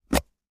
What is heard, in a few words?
plastic lid open